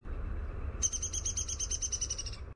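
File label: Downy Woodpecker whinny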